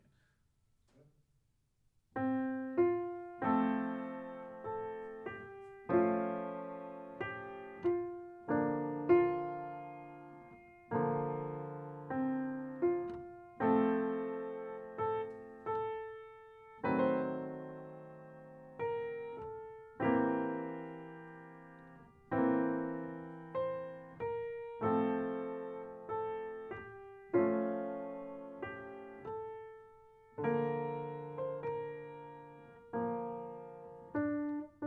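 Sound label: complex instruments Spaced pair in piano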